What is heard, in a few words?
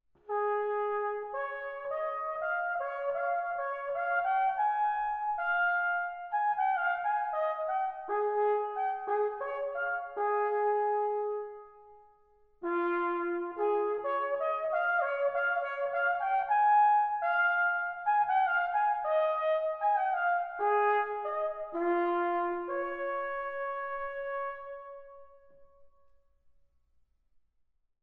solo